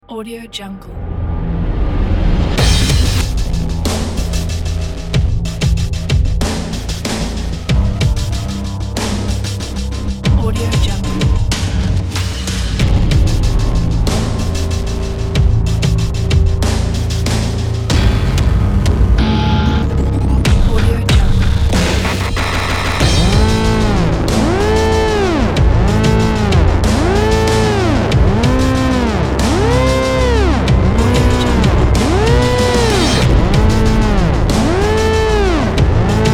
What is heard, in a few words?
industrial alarm